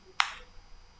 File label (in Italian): Risposta impulso Dirac